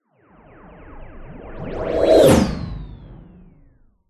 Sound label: cartoon anime whoosh impact whining tail